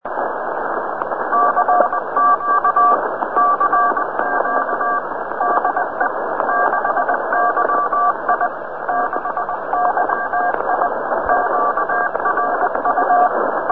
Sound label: cw cq